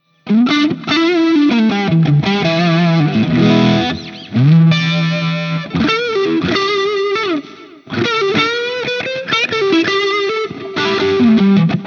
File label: Deluxe Reverb